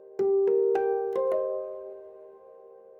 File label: ethno, 160, C